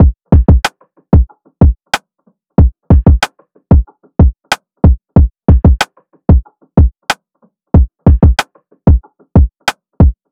Drum Loop - 93 BPM, RnB; Barely; Cymatics; ANALOG EVOLUTION Beta

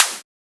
Snare, Psytrance; Mike

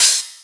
Open Hi Hat, Dubstep Drum Shots

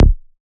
Bass D#; Ashes Bass

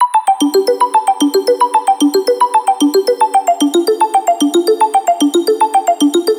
Arp G 150, Melodic Loops, Color Bass; Teardrop; Ghosthack - Color Bass Essentials

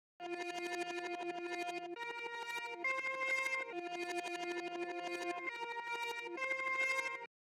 136 BPM F Min Tremolo; Melody; Reverse; Loop Stems; Cymatics; 2022 Melody Collection; Cameras

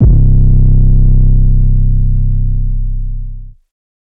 808 (C); Earthquake; Cymatics; GHOST (Beta Pack)

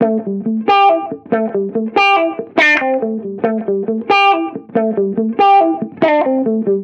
Reggae Guitar Loop 140 BPM A Maj; Vibrations; Cymatics; Vibrations Guitar Sample Pack

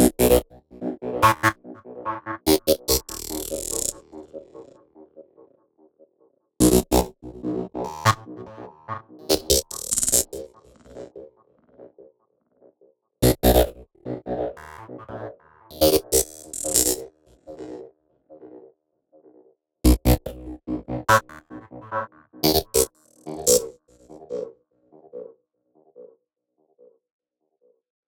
Slush Lead Line B 145 BPM, Psytrance; Lead Lines

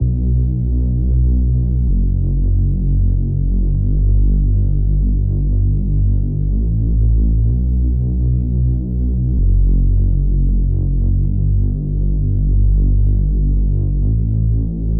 128 BPM B Min Reese; House; Loop Stems; Cymatics; Believer; Breakdown Loops

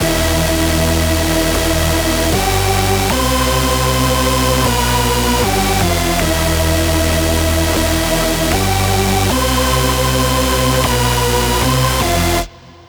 lead loop Emin 155; Music Loops; GhostHack

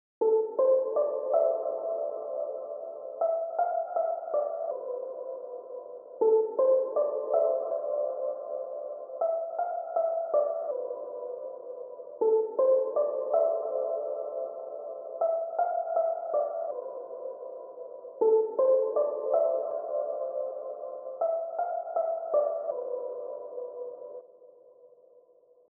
160 BPM A Min Synth; RnB; Loop Stems; Cymatics; Loyal; 2022 Melody Collection; RnB Pt 2

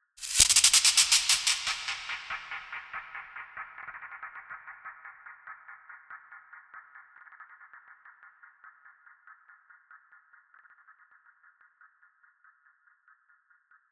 Voodoo 142 BPM, Ethno, Psytrance; Ethno Box; Voodoo Gris Gris

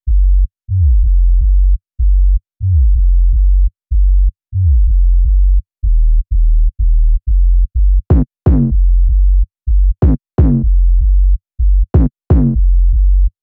C# Maj 125 BPM Bass; Melody Loops; Loop Stems; Cymatics; Rainbow Road

